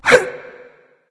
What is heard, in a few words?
gruntjump; smite; voicepack; mercury